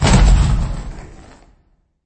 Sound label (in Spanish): caida